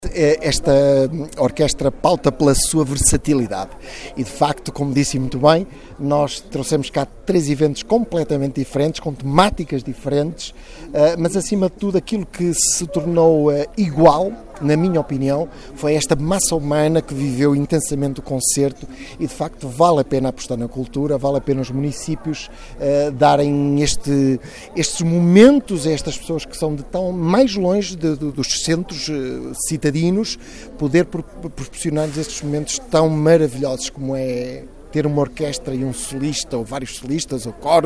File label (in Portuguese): concerto bandolins